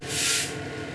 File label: Air, class 66 train; OpenBVE